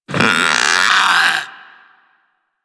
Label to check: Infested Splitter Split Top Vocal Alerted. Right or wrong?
Right